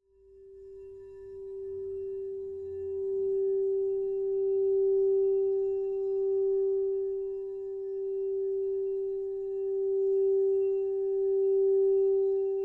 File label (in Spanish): BOWL SOL; sonidos de cuarzo; escucha y armoniza los chakras